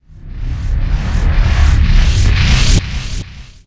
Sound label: repair reload